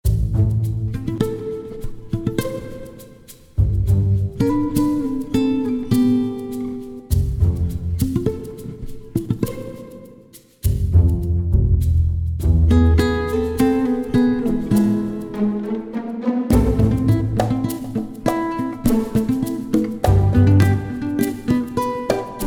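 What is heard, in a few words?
Sneaky Guitar; Jane the Virgin; Quirky; Latin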